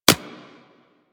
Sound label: Bullet Impact Generic; Surface